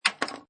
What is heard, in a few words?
Pinball Sound Clank With Ball Travel; Video Games